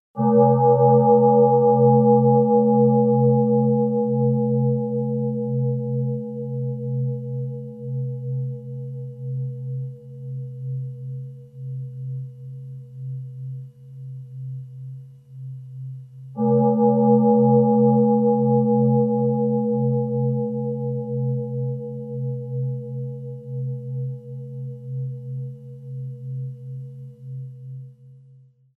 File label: Eternal Bells